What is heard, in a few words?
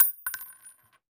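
bullet case bounce 308 cement close large; casings; gmod; viper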